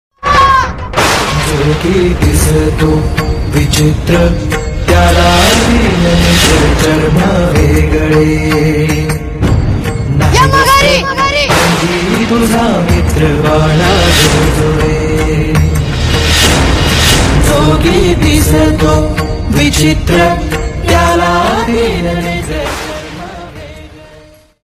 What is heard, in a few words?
Marathi Song Ringtone